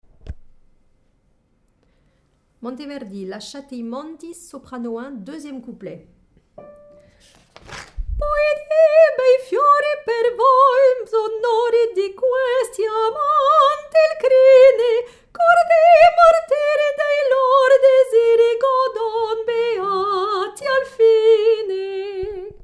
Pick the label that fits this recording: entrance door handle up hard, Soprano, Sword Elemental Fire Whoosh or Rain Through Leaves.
Soprano